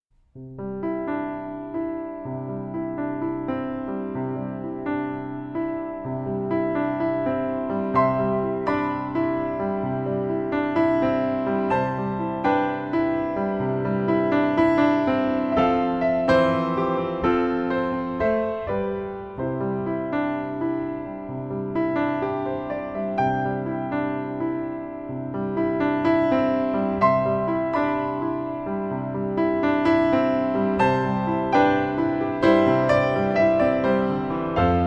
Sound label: piano